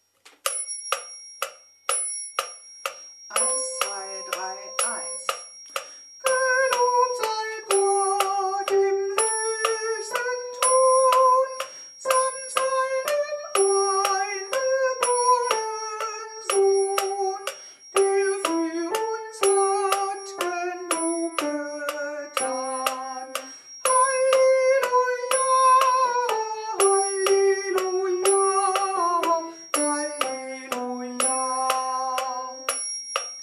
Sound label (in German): Gelobt sei Gott im hoechsten Thron Sopran